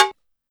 Bell, Yamaha DD-20

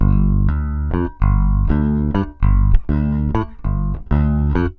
bass G, bass baion

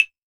CLAVES, HR-16 Drum Mashines; EdgeSounds

